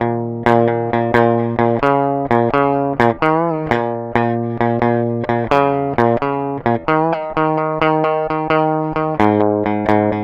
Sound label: Nur Nur Guitar; Guitars